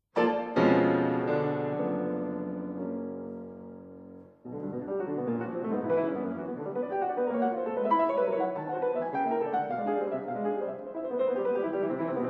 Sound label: Six piano pieces; V. Andreae; op 20 No. 6